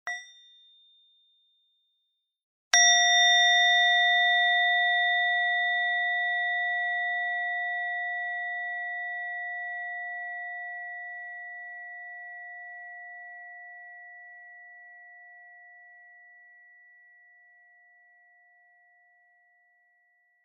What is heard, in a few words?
medium bell wake plus full